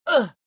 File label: pain